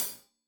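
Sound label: hat closed, hi-hat cymbals stereo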